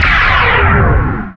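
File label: Laser Fire Interior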